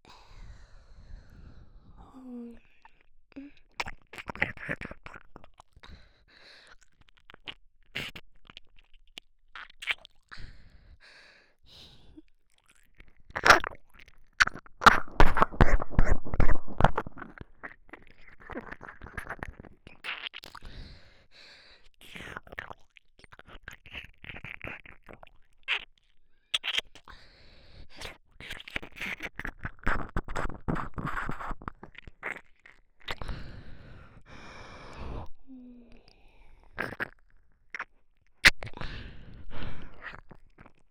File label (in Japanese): 耳舐め 通常 左; ボーイッシュなラバースーツ彼女に赤ちゃんにされて再スタートする人生